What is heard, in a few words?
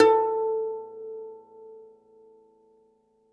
DOMRA, Plucked Strings